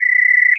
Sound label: Bug; Princess & Conquest v0.20.06